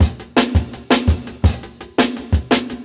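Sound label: Jungle Breaks, 168 bpm; Original Jungle Breaks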